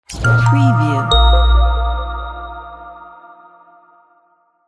digital protons

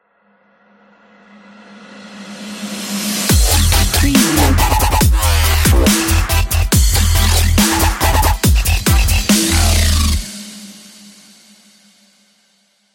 dubstep logo

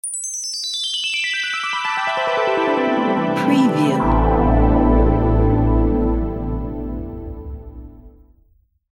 elegant magical logo

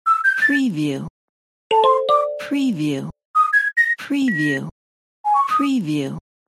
whistle interface note

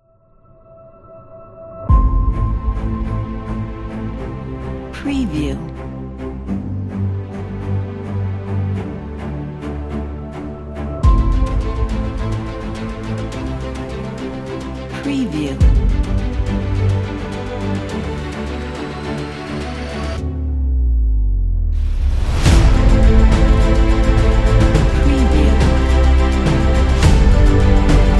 epic orchestral violin

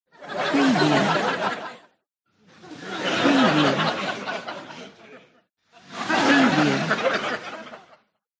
audience laughing

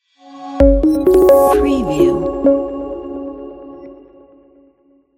minimalistic logo

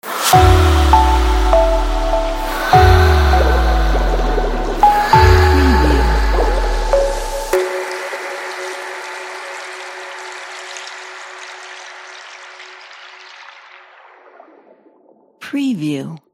elegant water logo

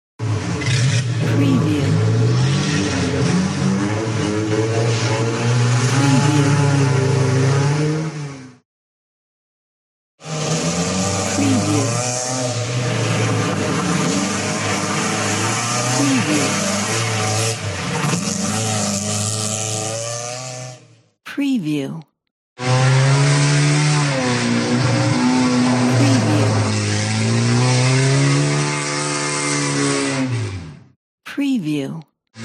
racing car

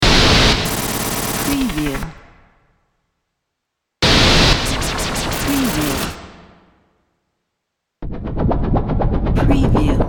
noise fx

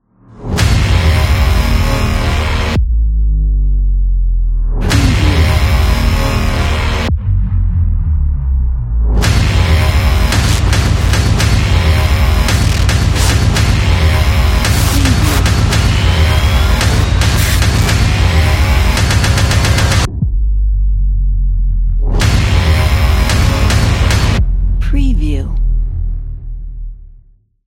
aggressive horror trailer ident

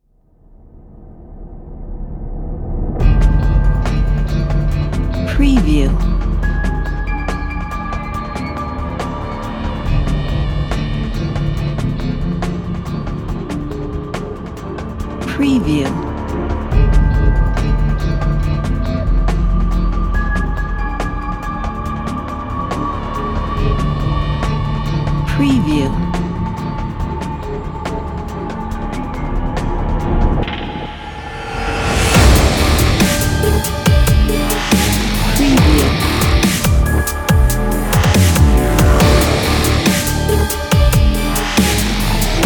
creepy trailer

